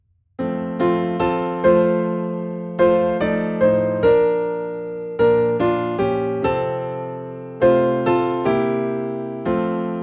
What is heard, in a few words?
Piano in F